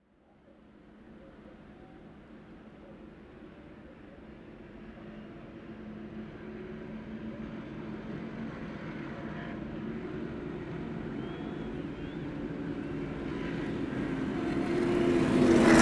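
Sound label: car racing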